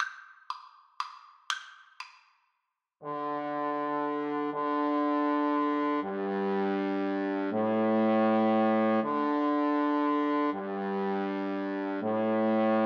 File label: Trombone Duet